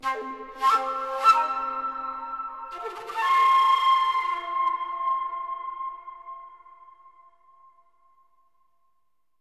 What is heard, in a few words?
pipe; Myst III Exile